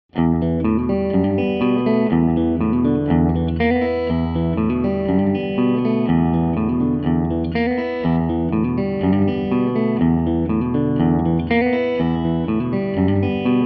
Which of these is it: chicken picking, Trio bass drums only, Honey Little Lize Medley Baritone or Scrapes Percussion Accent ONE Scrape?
chicken picking